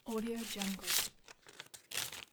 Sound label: paper page rustle; stormwaveaudio